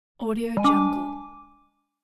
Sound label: time is up